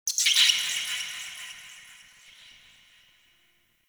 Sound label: Forest Bats; Warframe; Grineer Forest